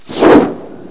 swoosh

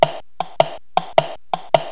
TIKTOK

